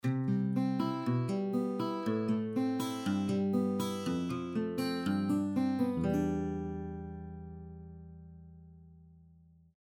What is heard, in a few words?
1890 Washburn pick